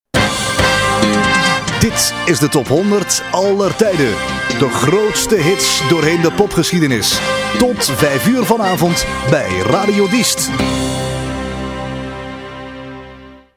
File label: jingle; Diest TOP 100